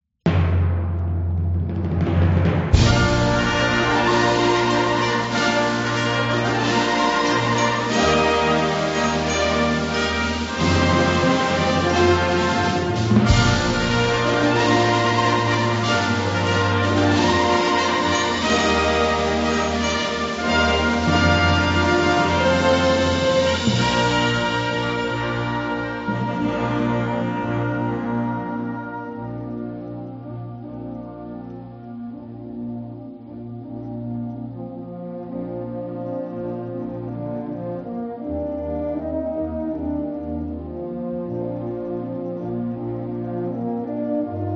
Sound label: Fanfare Brillante